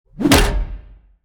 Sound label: morgenstern hit; player